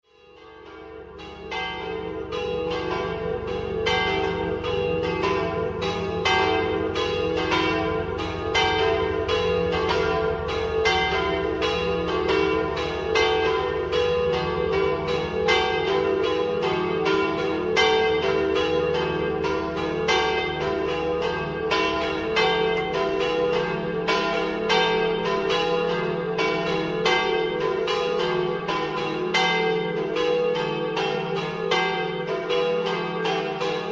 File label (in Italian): RIGOLATO, campane